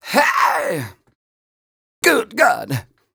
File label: 130 mel d-flat, Vocals; Mutekki Media - Diamond Vocals 2